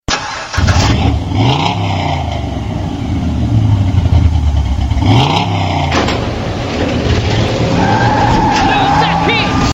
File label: Powerfull Engine